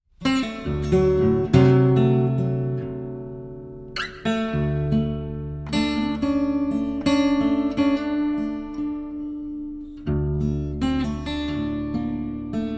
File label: Improvisation; Artistes locaux